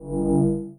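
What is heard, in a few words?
ambience soft buzz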